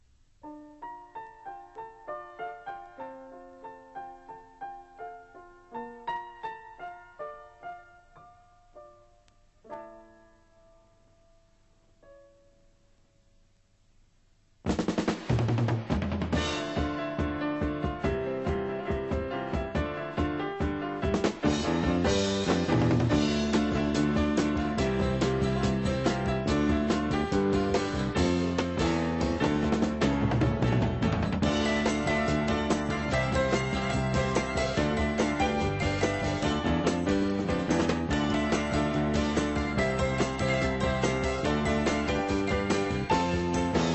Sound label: GRAND PIANO